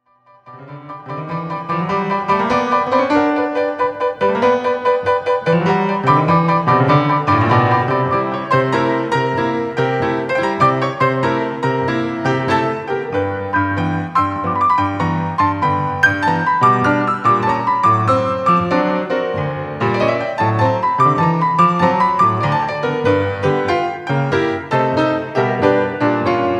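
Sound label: Suitcase Blues, Piano Solo; Boogie-Woogie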